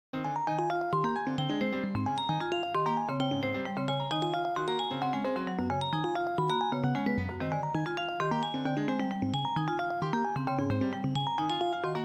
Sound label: harmony example